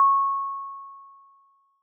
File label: resonant tick